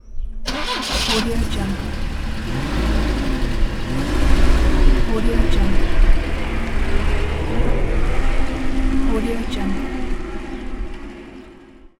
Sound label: car start up